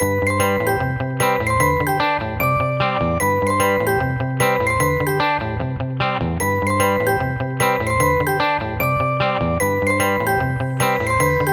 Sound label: Corporate Work